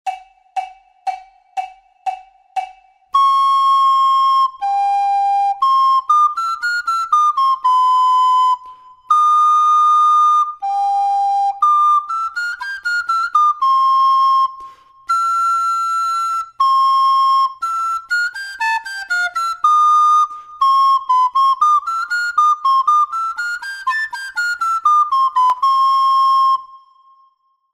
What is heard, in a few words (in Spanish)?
DUETO FLAUTA